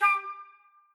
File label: penguin alert sound; CodenamePenguin